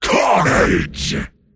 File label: attack pain; Dota 2; pudge; arc